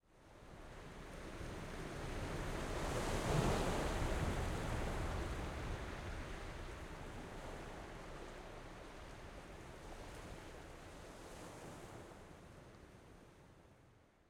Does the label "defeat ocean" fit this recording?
Yes